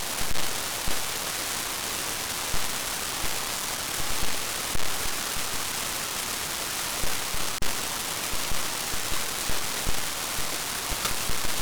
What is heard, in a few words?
corrosion; Sound of MEMS with advanced corrosion on the L channel; Sensing the Forest; A DIY Solar-Powered Audio Streamer that Can Record Samples at Solar Times